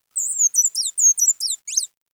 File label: Little Hermit crystallized song; Julian Kapoor